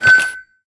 level load complete

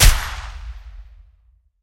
vickers k last shot right; guns; War Thunder

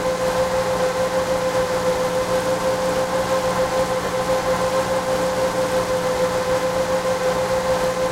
comet engine rpm 99, tanks; War Thunder

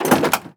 truck door open

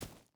footstep barefoot gravel run; Rust

